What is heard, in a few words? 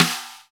SNR, Cheez Snrs, Roland; Club-50 - Foundations Roland